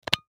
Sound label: Thermos Lid Slide, Thermos Lid Slide Cover Open; Objects; Blastwave